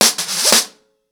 FX 90 BPM, acoustic drums; The Roots Drumloops

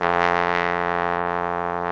BRS FF BONE, Solo T.Bone; Roland L-CD702; Solo Bone 1

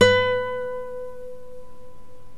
NYLON GUITAR

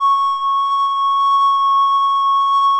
C#6B, kobliczek; SoundBytes - Early Patches

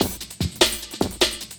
LOOP, 150 BPM, Drum & Bass

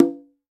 CONGA TONE; World Combo

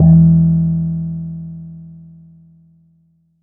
Gamelan Gong; Cymbals

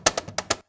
CAJ FILL, cajon fill; Univers Sons - Raricussions

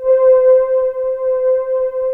ARP, Analog Fantasies; USB Soundscan vol.49; AKAI

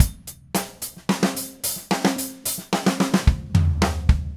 Red Maple Groove 110, DW Red Maple Kit

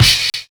NO VERB; Univers Sons

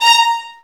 VIOLINS, Synclavier Strings; ILIO

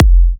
Kicks F; markus hakala

